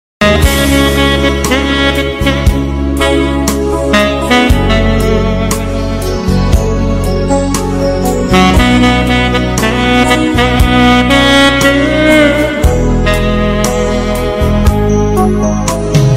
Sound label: Saxophone